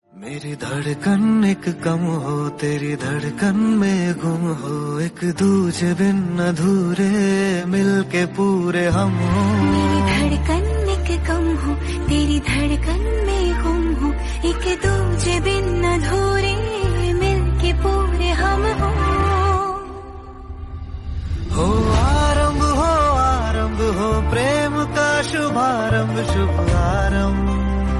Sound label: hindi song